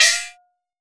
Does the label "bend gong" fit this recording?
Yes